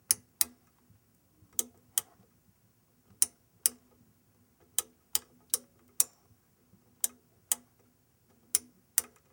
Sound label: Lamp Clicks